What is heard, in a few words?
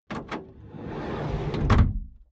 van slide door close; Autos